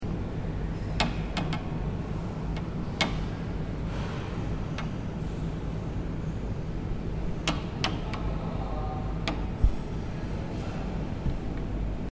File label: Arrow hitting Bow